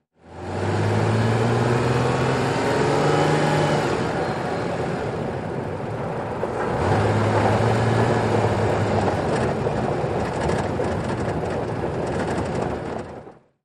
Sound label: SUV Trk Pav To Gravel